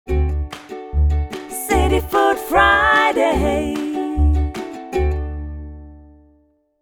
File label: Jingle